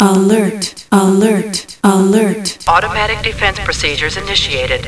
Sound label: alert